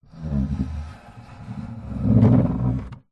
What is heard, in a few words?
household coffee table wood maple heavy legs slide wood floor slow